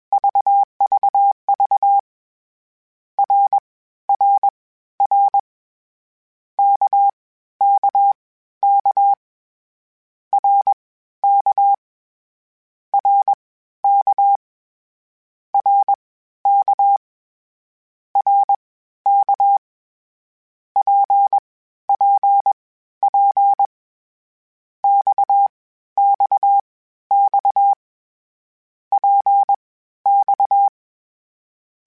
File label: CW Code Groups Mixed Short Runs Balanced; Morse Code; HamRadio